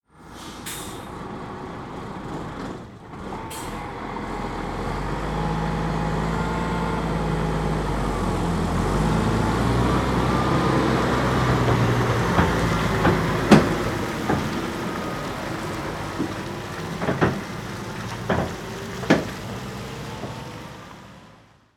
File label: Mountain Truck